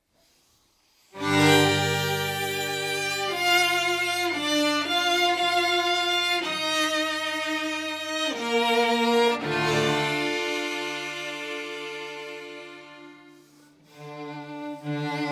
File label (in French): Quatuor cordes sol min; Grieg Edward; Op 27; 1er mvt; Quatuor Amicis